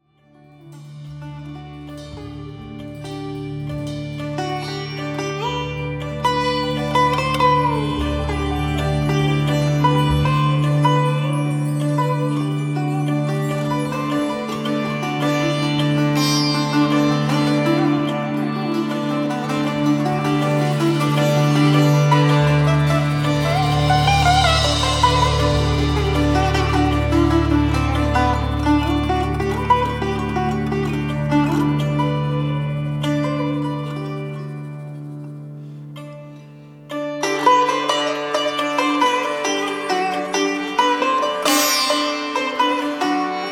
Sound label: Blues